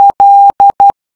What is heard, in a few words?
Morse L